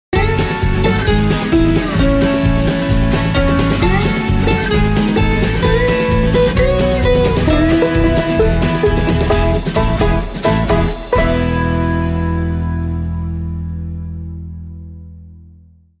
Banjo

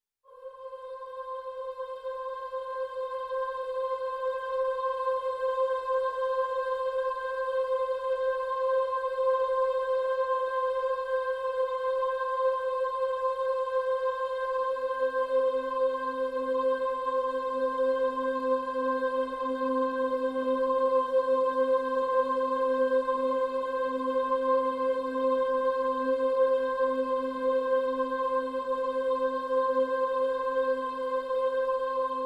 Pads Vocalicious (C)

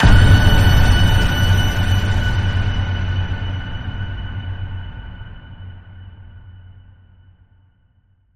Ping, F sharp

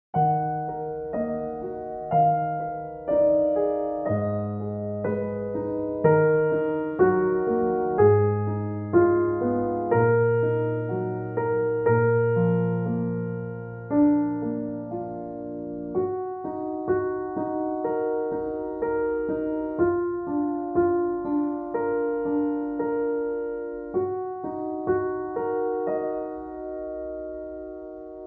Piano

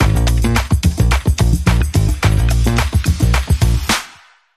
Swing

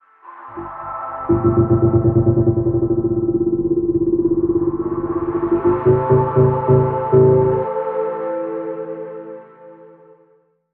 Pulsing Aura Sting

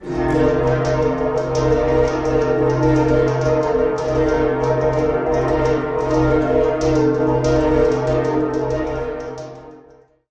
Rack My Brain Musical Pulsers

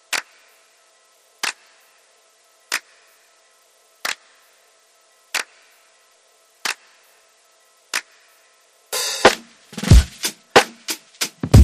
Beat

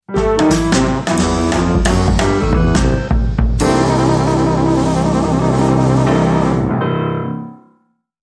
Stinger

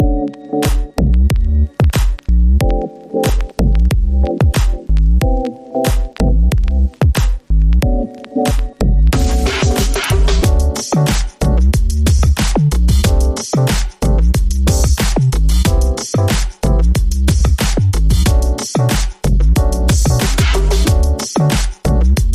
Gentle Mallet, Underscore

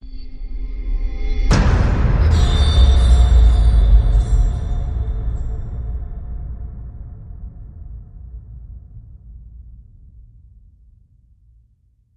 Hit Hor Spooky Chimes

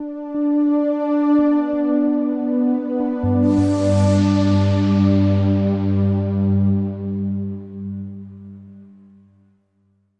Sonic Earth Pad

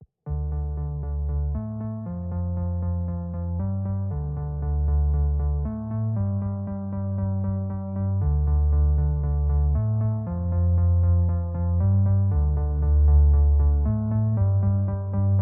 Underscore

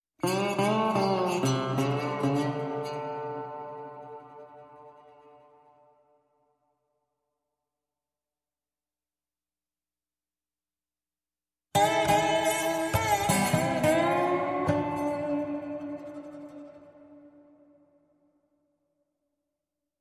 Bed Dminor, Guitar Only

